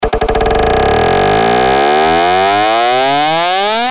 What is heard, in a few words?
spin